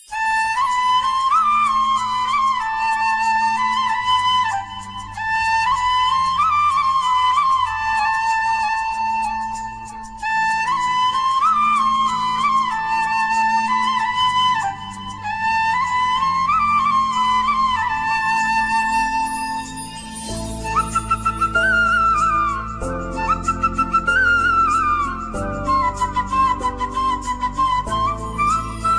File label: krishna flute, ringtone